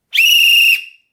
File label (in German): trillerpfeife klingelton schiedsrichter pfiff; trillerpfeife sound